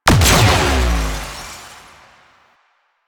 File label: Antimatter Drop Explosion; Warframe; Warframes